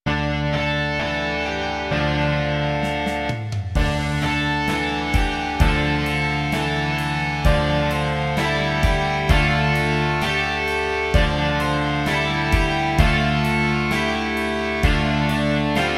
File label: rock